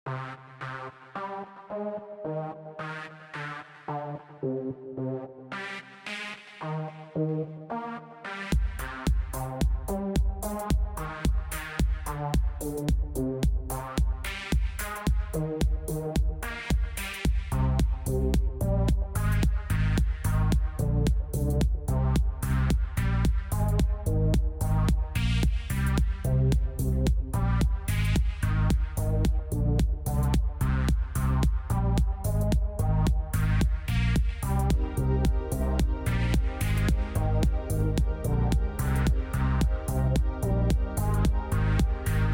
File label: techno trip; music-dance